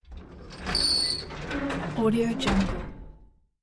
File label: doors metal sliding fireplace